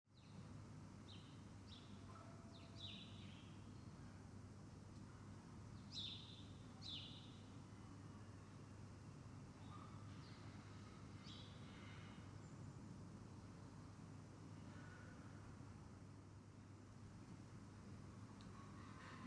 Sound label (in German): spatial mic virtualisiert hinterhof; voyage audio